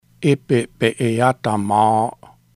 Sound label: laugh noise